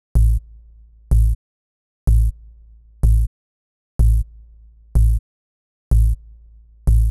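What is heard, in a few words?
bass 125 bpm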